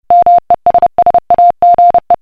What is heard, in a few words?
Morse Code Sms